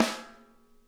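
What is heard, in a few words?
center, snare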